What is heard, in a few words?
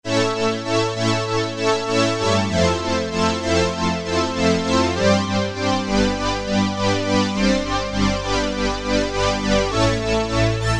Roland MKS-50, TeknoStrng; Factory examples